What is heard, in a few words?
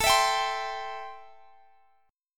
C 6th A, chord